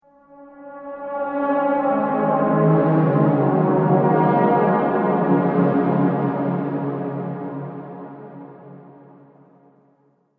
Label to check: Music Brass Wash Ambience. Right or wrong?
Right